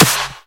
hand clap d sharp key, clap single shot tuned to the D# note. Loudest frequency: 4454Hz; percussion; single hits one shots